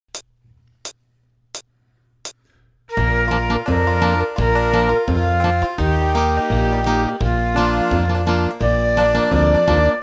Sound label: flute in Bb; Old Macdonald